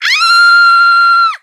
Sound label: scream woman